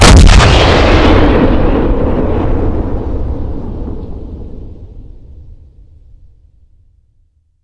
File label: Vehicle Weapons; Red Orchestra 41-45